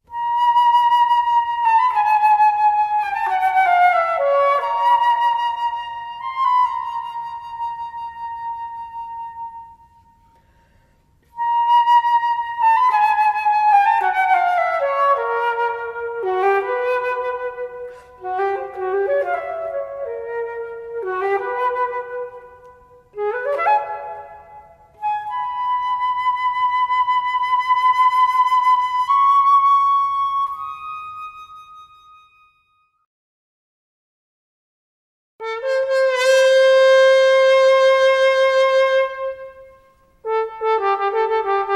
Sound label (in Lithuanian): Fleitos ir trombono pavyzdziai